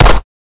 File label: fire, glock; Urban-Coalition; Decay; arccw ud